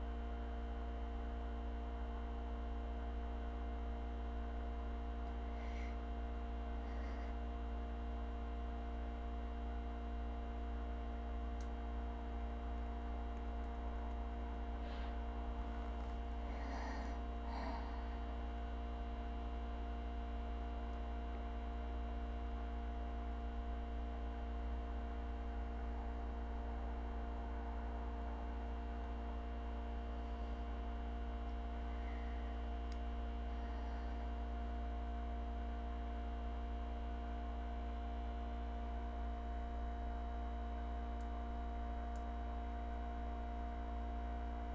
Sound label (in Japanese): かすかな吐息